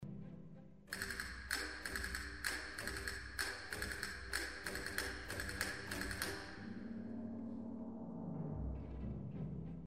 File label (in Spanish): castañ gong